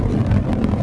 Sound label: Roll; Jonah A VeggieTales Game